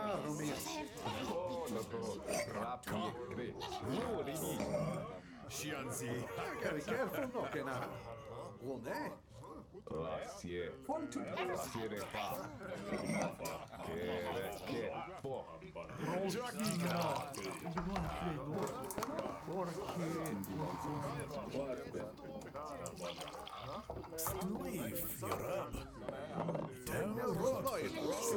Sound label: dwarven tavern loop, ambiences; Michael Ghelfi; RPG Ambiences Vol. 8 (Short loops)